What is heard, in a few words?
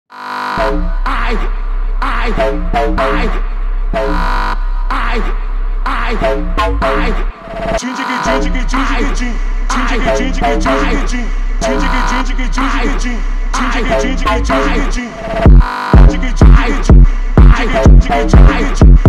funk phonk